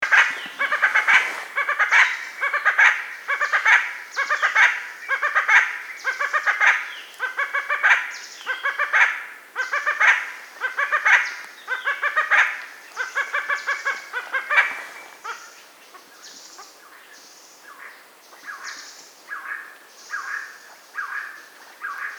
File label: Red Spurfowl Galloperdix spadicea spadicea